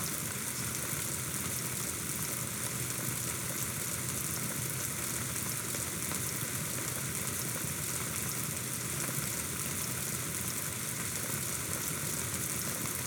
Sleep Rain

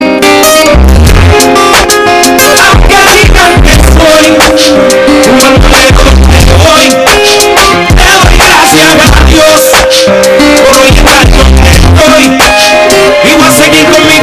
BASSBOOST

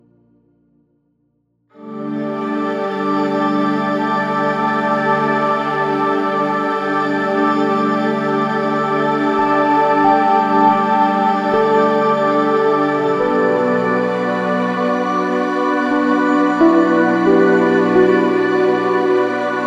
Relaxing Chill Out Music

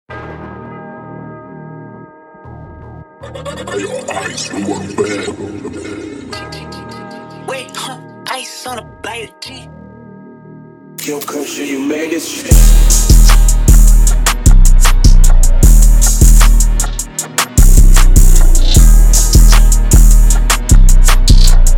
Type Beat 154 BPM D min

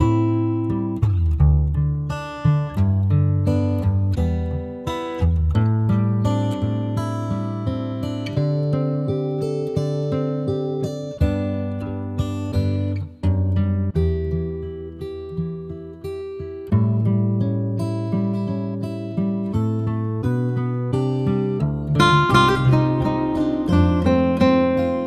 Bubbly Music for Ambience

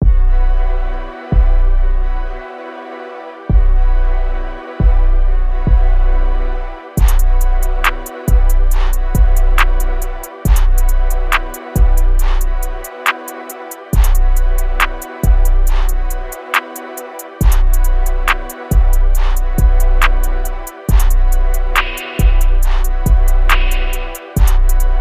Trap 140bpm minor

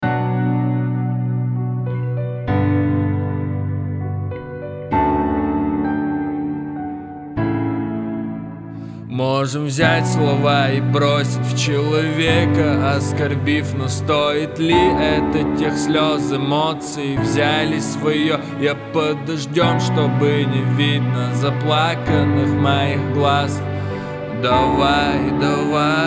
Piano

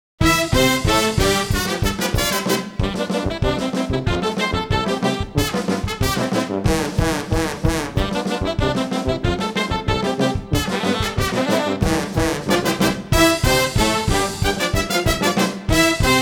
Brass Band Can Can; KPM

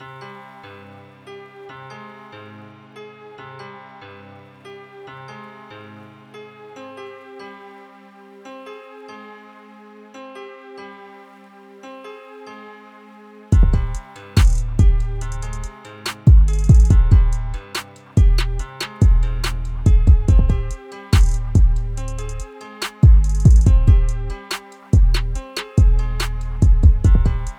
Bass Boosted Beats, Heavy Test

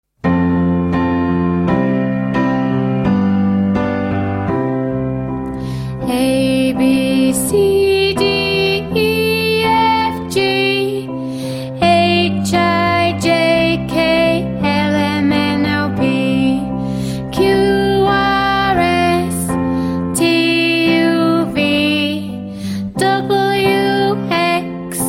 A.B.C. Song, Kids English Songs

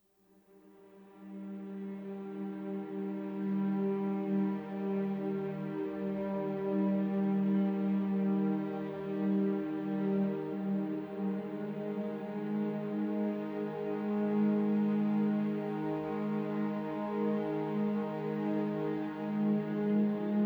Native American Flute

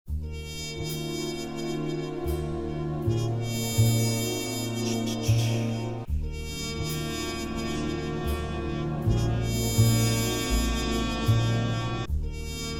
Tango

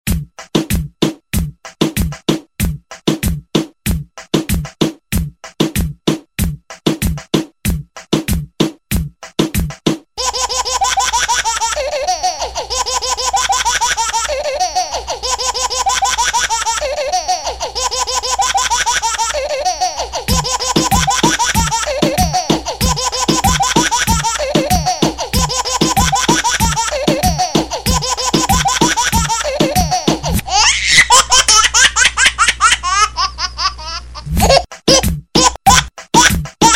Samba

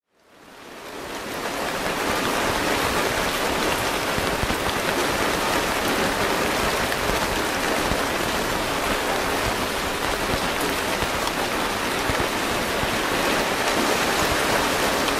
Rain Sounds, Wet

